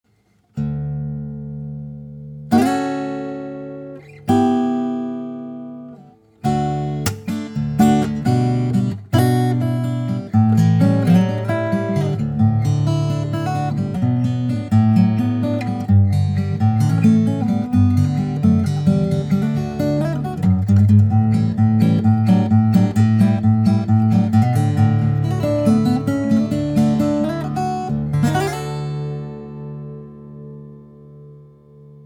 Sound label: Guitar; Vintage Prewar Guitars